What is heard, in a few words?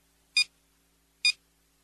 tick sound